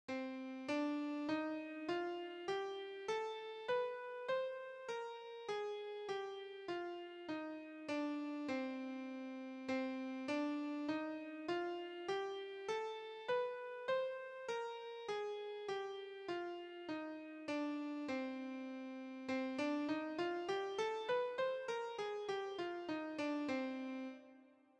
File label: melodic minor